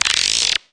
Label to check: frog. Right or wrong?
Right